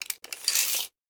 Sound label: Tape Measure Open; Tools; 1001 Sound Effects